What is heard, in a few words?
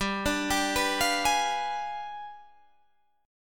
G 7th Flat 9th, Guitar, Standard; arpeggiated; JGuitar